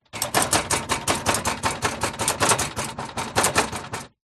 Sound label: industrial plastic metal heavy machine or tall unit shake wobble